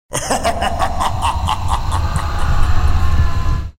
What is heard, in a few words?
laugh; quake